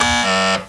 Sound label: buzz deny; buttons